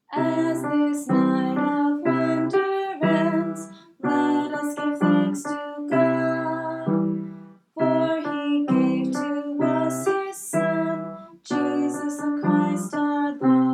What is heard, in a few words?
recitative